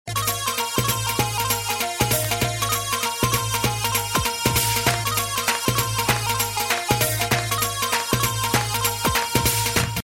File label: oppo smart phone ringtone